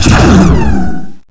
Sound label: SPACE RAY